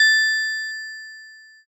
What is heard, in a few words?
glocken